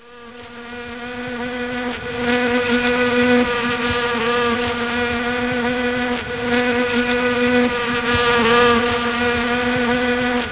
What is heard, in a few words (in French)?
moustic